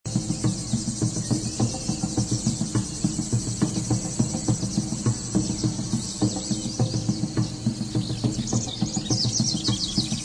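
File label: African savanna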